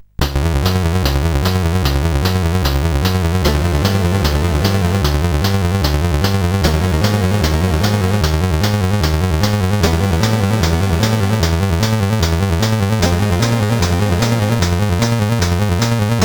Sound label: sid CSG8580R5; Escape from the Planet of the Robot Monsters